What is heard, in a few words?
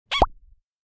Designed pop up